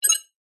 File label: science fiction computer console beep button press